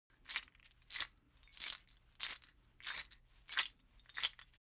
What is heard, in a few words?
metal chain movement sequence